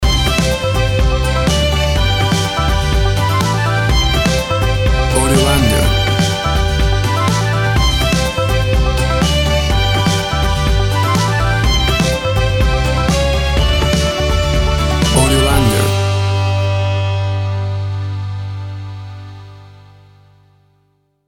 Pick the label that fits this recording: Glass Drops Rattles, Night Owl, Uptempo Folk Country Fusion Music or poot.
Uptempo Folk Country Fusion Music